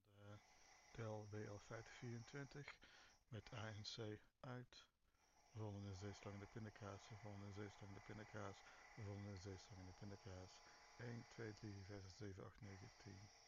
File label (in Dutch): ANC uit Boor